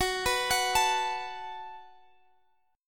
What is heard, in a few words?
Gb Minor Double Flat 5th Gb down Guitar Half Step Down; Gbmbb5